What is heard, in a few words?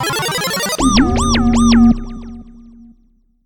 level up; typing; tf2ware ultimate